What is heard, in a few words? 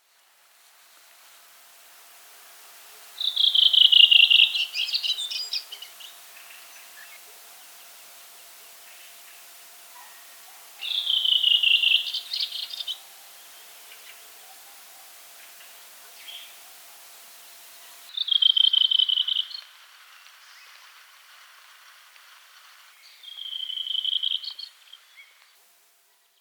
redwing